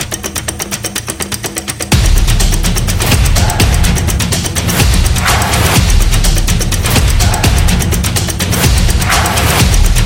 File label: Stomps; upbeat drum